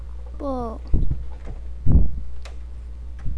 bowl

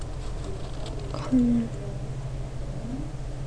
camera

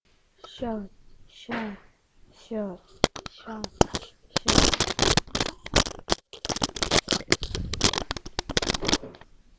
shut

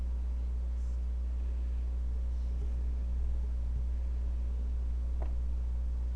gobble

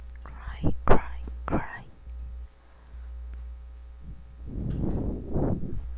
cry

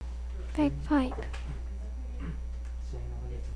bagpipe

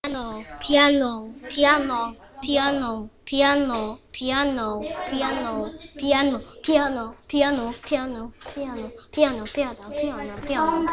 piano

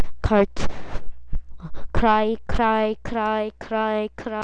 cry

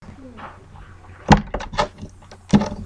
kick

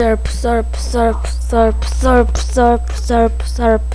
surf

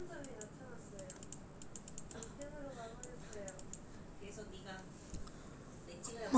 microwave

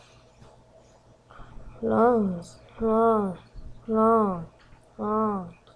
laugh

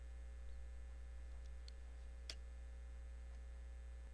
beat

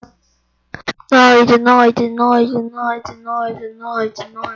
noise

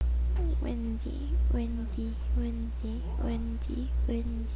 windy

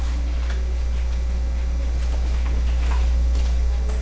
bottle

